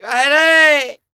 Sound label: VOC, Flamenco, male; Best Service ProSamples vol.46; AKAI